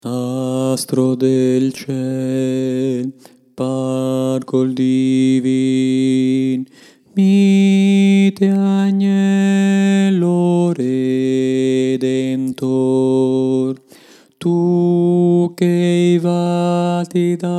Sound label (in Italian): astro del ciel bassi